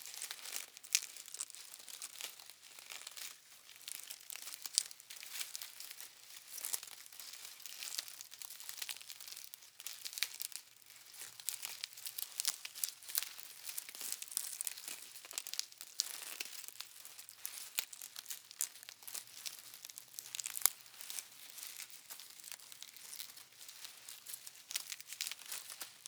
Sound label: RUSTLE Studio performed broadleaf reeds manipulation LOOP